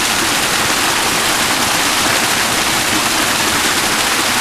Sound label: waterfall top; Titanfall 2